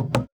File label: Moody Park Playground Perc; Houston; percussion